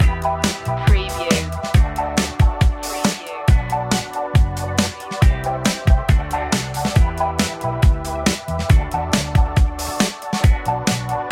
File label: fashion reggae loop